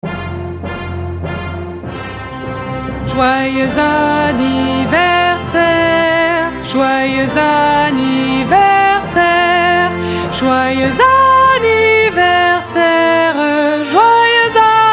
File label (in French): joyeux anniversaire chant